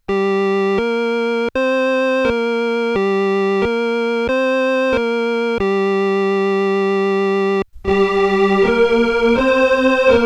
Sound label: Chorus Reverb Orgel